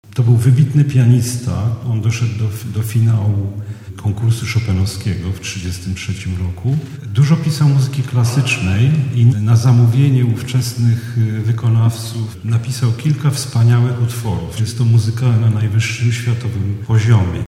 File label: pian